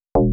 donk

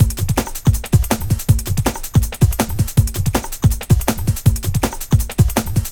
162, Jungle Breaks; Pro-Roller; Jungle Warfare 1&2

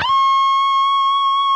PRS D, Guitars, LEAD; Spectrasonics; Hans Zimmer Guitars VOL-1

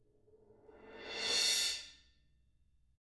ZILDK SWELL

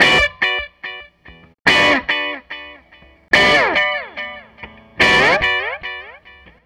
SG Guitar Loop

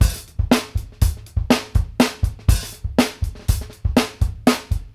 tight hat, drum; Sampleheads; New York City Drumworks VOL-1

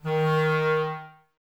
Clarinets, Wood Winds

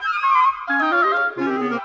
Winds, Orchestral

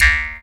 jaw, Didgeridoo and Other Primitive Instruments; Big Fish Audio - Didgeridoo and Other Primitive Instruments; JAW SIMPLE

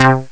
Sequencer, Amiga Soundtracker; ST-XX Amiga Soundtracker Sample Packs

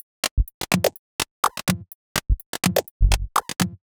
Top 125bpm, House Drumloops

